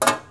Drop, INDUSTRIAL

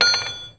Hammering metal; INDUSTRIAL

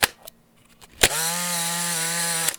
Camera SX70

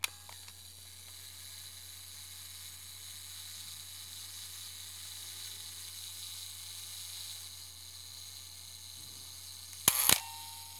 Camera AF

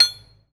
Hammering metal; INDUSTRIAL

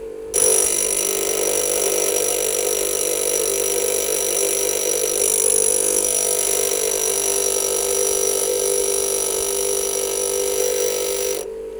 Grinder; INDUSTRIAL

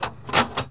Tool box; INDUSTRIAL

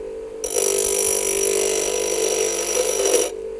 Grinder; INDUSTRIAL

